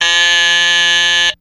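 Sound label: Door Buzzer Annoying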